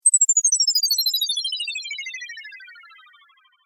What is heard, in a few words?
Chimes